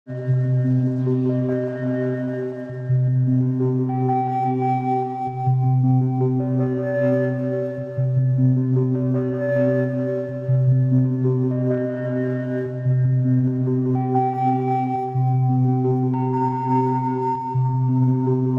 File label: Absynth Single Synth Loop